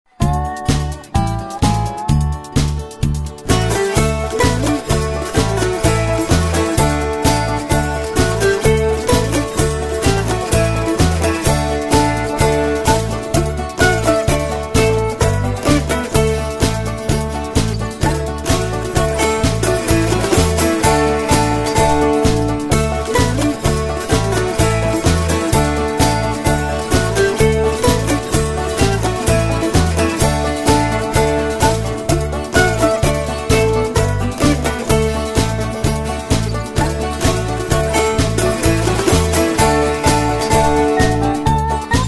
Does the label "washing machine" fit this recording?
No